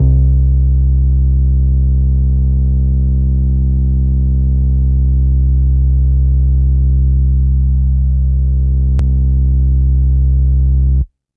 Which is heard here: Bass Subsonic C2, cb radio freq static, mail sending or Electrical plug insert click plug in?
Bass Subsonic C2